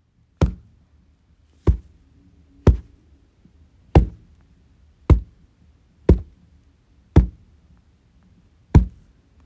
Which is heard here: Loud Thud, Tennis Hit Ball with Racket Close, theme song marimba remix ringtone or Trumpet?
Loud Thud